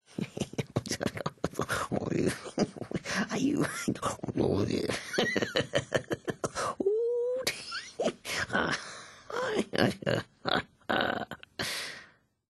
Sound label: Laughing; Cicero